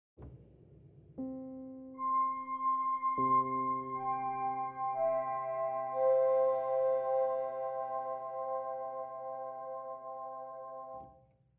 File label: FX U4; PianoTeq